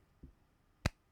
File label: body; ぺち